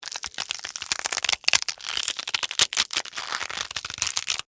Crack Crunch Celery, Texture, Crack, Crunch, Celery; Twist, Stalk, Long; Food Sound Effects; BlastWave